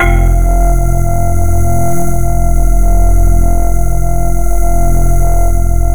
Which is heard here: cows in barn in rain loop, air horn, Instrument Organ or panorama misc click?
Instrument Organ